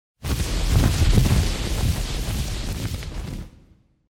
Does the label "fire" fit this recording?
Yes